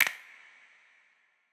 Percussion; Cymatics; Light Free Sample Pack; Drum One Shots

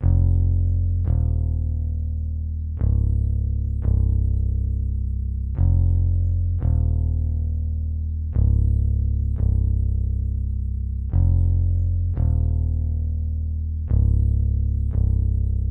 173 BPM F Min Bass, melody loop stem; Trap; Loop Stems; Empire

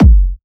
Kick, Techno, One-Shots, Drums; Ghosthack; Techno Sounds 2025

